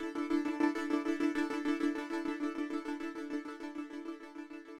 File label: RHYTHM CRDS; Vostok Inc.